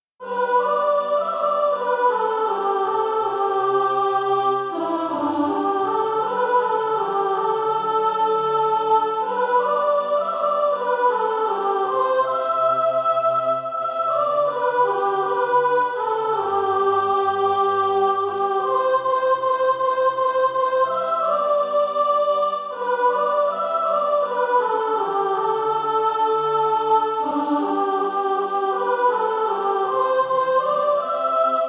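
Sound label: soprano, SATB Practise Music; Christ Is My All in All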